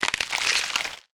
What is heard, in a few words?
medium gore; factorio 2.0.55